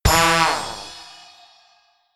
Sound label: notification; samsung Aleart